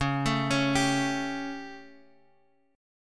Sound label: G Suspended 2nd Flat 5th Db